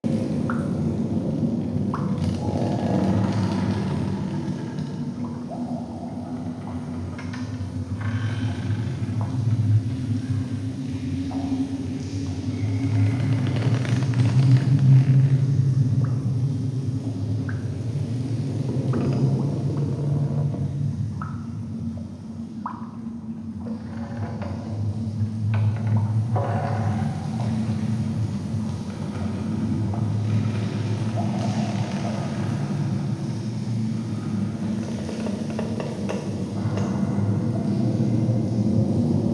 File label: Root Cave Bed Loop, Ambience; Neathuns Root Cave